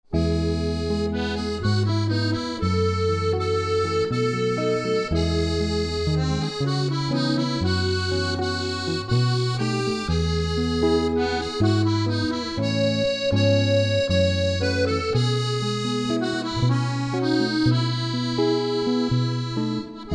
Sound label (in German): Akkordeon Git